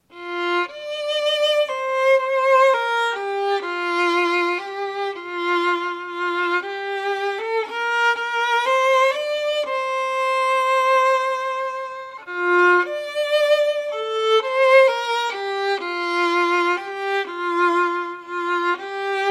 voici l enfant violon; recueil Louez Dieu

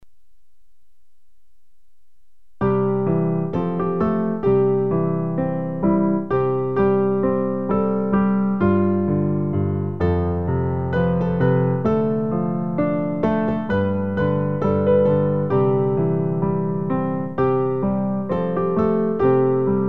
piano

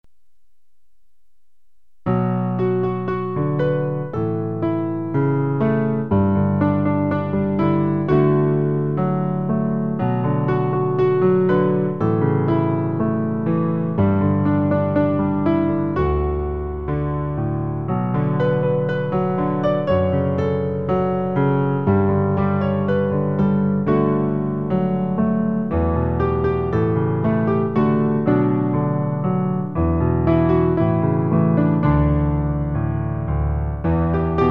piano

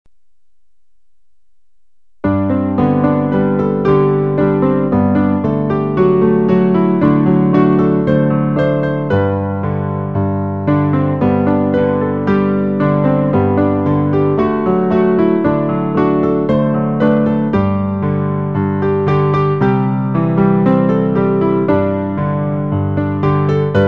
piano; recueil Louez Dieu

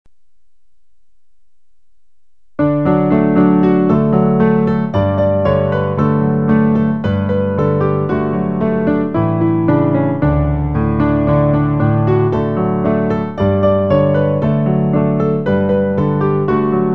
piano

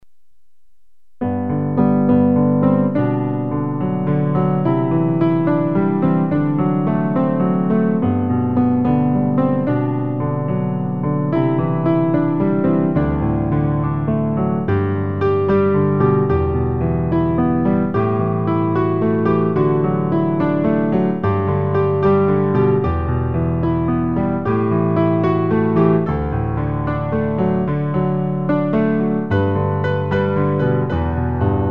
piano

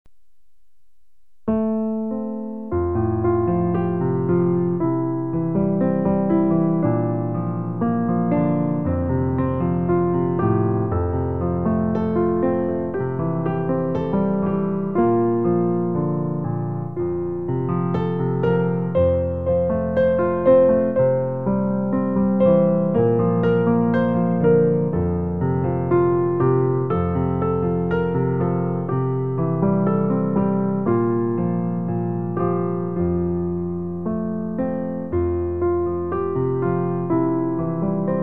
piano

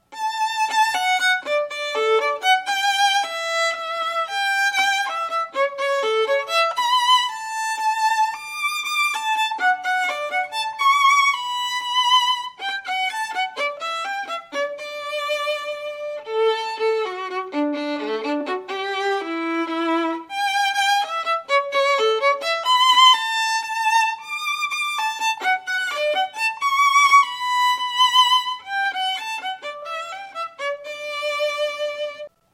trompette

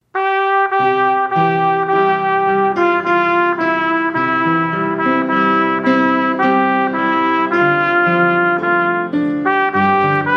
trompette

